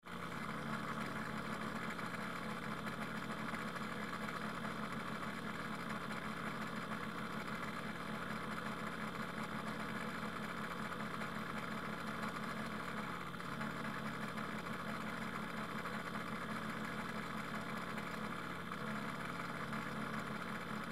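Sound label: zvuki holodilnika, refrigerator sounds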